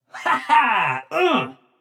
nv yay; smite; xbalanque

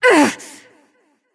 grunt hit; smite; jingwei

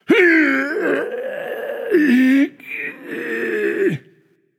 grunt lift; smite; zeus

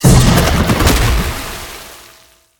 ice; smite; merlin

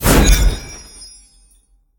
projectile; smite; olorun

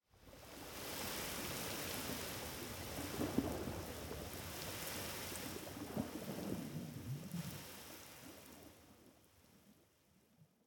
victory surf; smite; pele

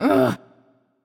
grumble; Smite; athena

